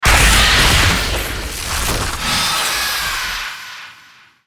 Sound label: spider dying